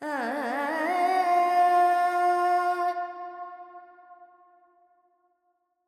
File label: Verb Dorian, Vocals, Carnatic Female, Alaps, 70 G